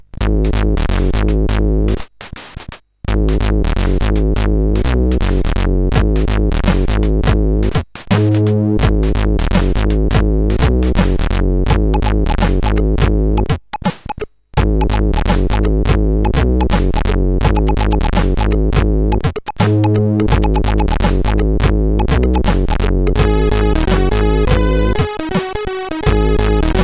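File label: Funky Track, MOS8580R5 sid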